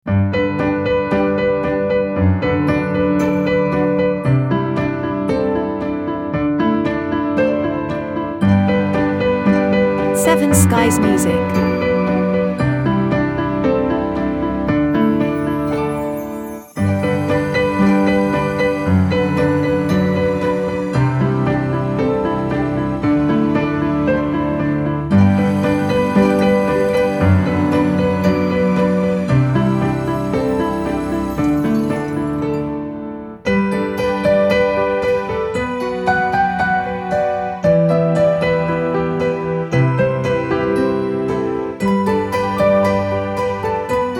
Romantic Moments Piano

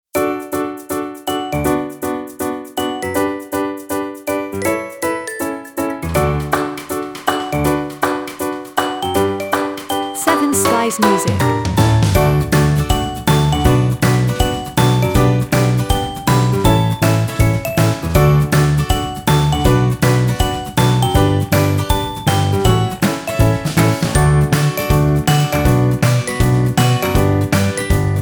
Cheerful Piano Ad

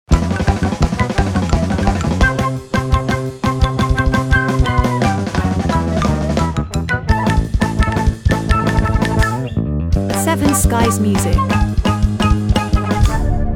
Cartoon Bone

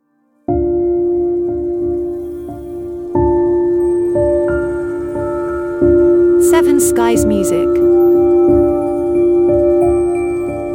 Ambient Reflextive Turning Leaf

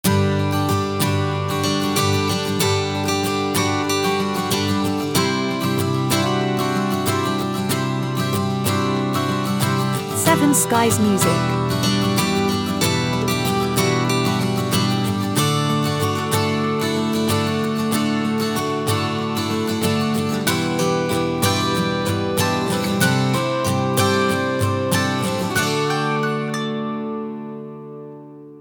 Acoustic Summertime

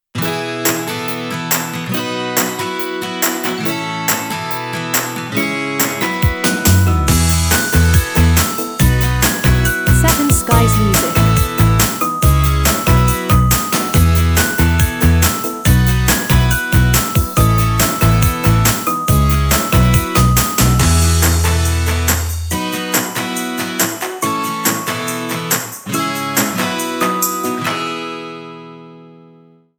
Cheerful Promo

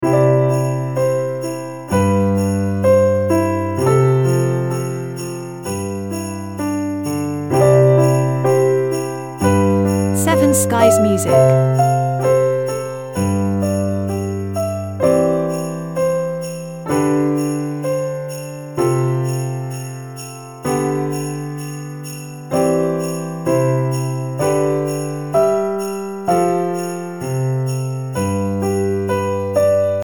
Christmas Memories, piano and sleigh bells

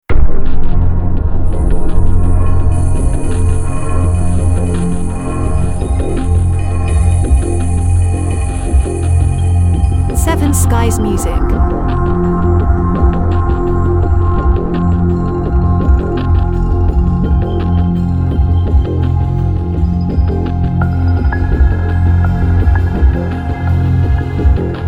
Ambient Dark Film Series

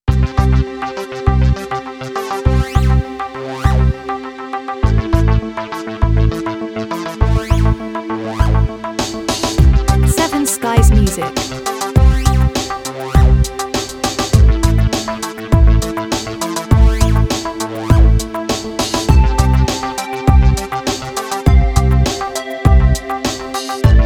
Positive Movement

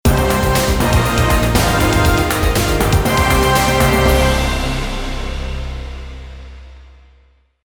Newscast Stinger

